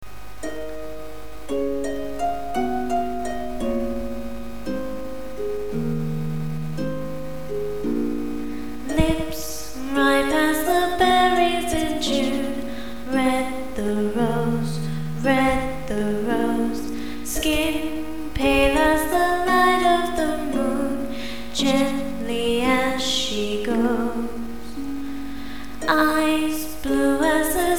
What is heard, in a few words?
Chimes